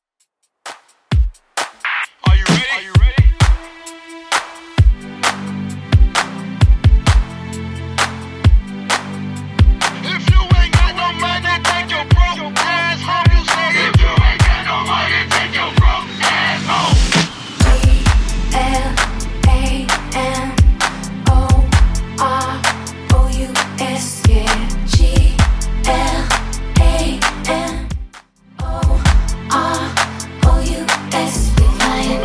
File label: 2ks DJ Friendly Redrum 131bpm